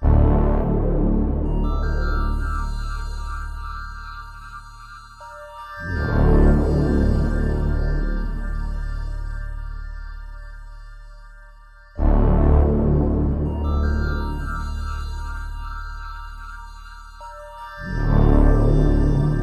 ominous, Electronic Loops

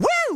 "woo"

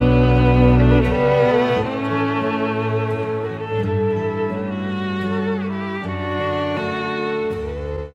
strings whistful; Loops; Sad

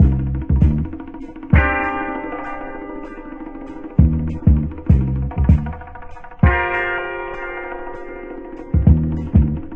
bass, Loops Phonky

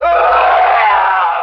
scream

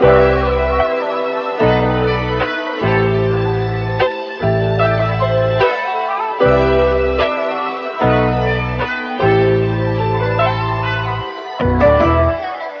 guitar, Loops, Phonky

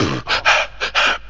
good to go, Beatbox, Drum loops

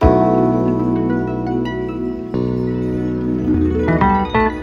keys and guitar, jazz loops

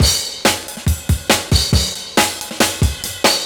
Drum loops Boombap

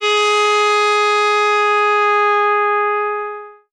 guzzle, Horns; Notes

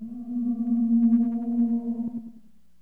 creepy; Rumbles

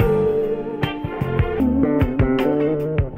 keys, phonky loops

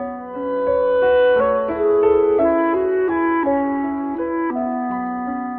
sax, sad

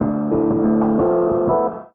guitar, Loops

